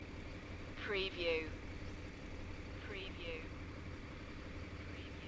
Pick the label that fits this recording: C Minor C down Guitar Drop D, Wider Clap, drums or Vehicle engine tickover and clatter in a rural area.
Vehicle engine tickover and clatter in a rural area